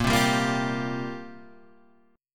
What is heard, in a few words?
F Suspended 2nd Suspended 4th, A sharp